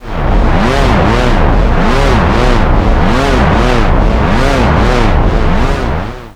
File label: BIG BAD SWOOSHES; KillerTweaksII; DirectWave